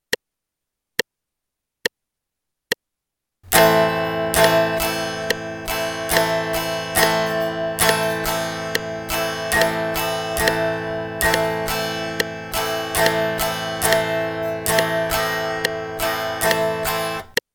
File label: Am strum in eighth notes pattern, guitar